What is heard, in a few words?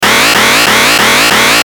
Alarm Ascend Buzz Loop; Loops